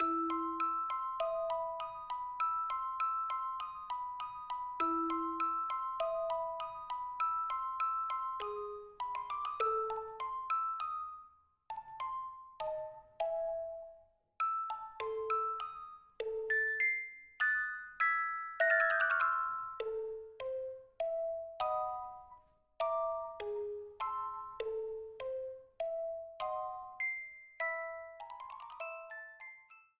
Ghost House Night; SMM2; NSMBU

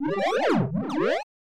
Erase Fade Out; Mario Paint

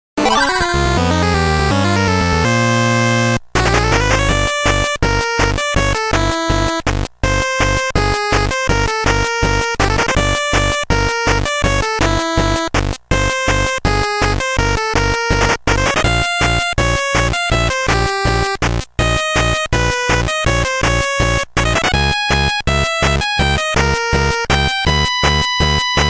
Danger theme; MPe; Balloon Burst!

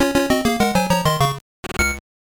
Golf Clear (Par, Under), A music track; WL3

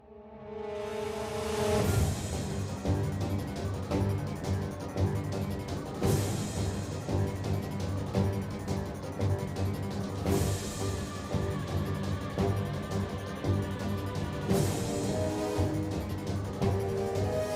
Missile Launch, A red streamer theme

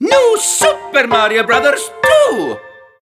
Banner Logo; NSMB2

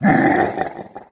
Bowser Laugh; Brothership

